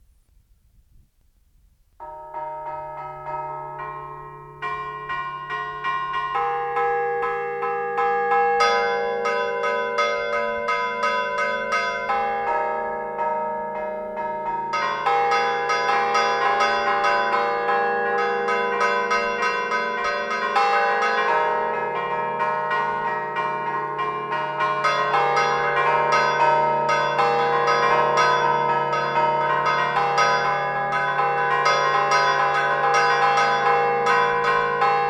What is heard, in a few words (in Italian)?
Campane; Badalucco; Imperia; Archivio Balma Campane Liguria